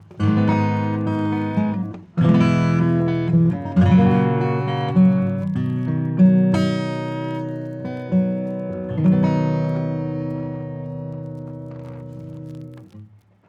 acoustic Vinyl; Analog Pro; Poly

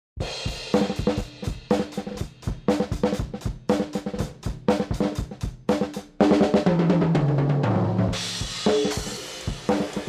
EQ Band Pass; Kirchhoff

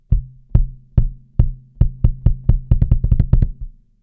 Kick bypass fet

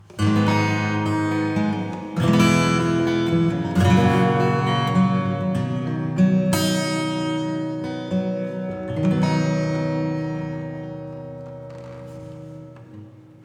Transatlantic Plate Reverb acoustic

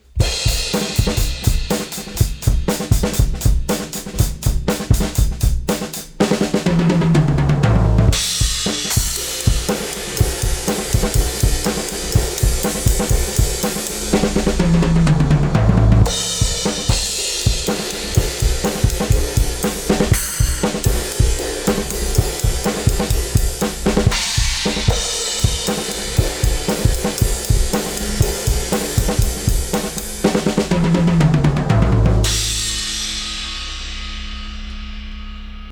Drum Bus; ADC1 Compressor

